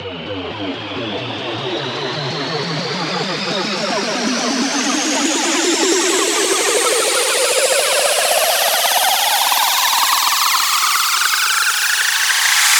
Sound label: fx riser 150 speed up; Virtual Riot; 8bar